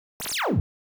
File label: airhorn; radio susan